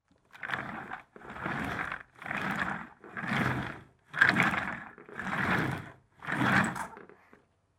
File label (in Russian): zvuk kresla